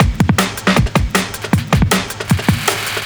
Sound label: drums, Hardcore Breakbeat, 157 Dmin; Big Fish Audio - Hardcore Breakbeat Action; Disconnected